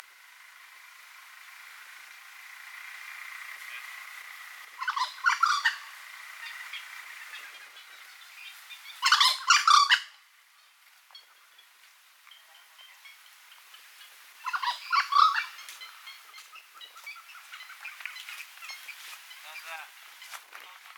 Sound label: White-necked Crow